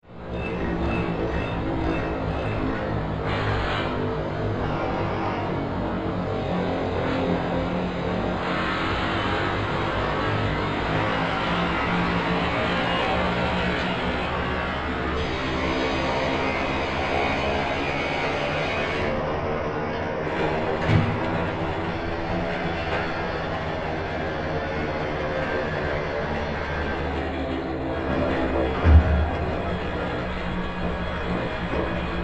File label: Industrial Area Industrial, Machines, Wined Ups, Wined Downs